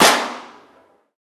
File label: bullet impact hollow